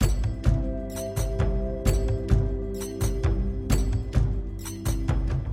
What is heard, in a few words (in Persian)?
senj dammam moharram, سنج و دمام بوشهری